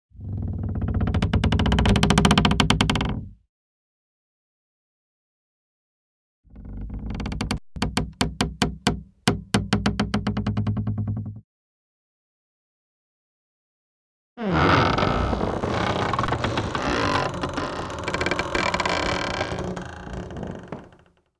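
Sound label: door creak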